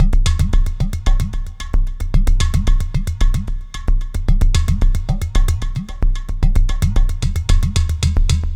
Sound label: Terrestrial Hybrid, Processed Percussion